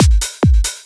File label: club 140